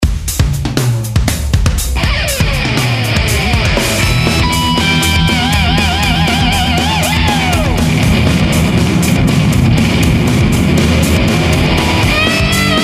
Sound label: metal